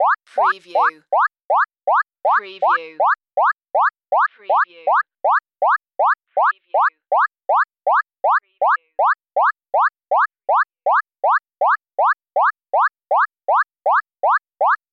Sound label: Alarm Chirps Loop; sound effects bible